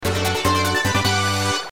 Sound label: badge get; Paper Mario 2 The Thousand Year Door